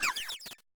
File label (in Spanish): Grito de Nymble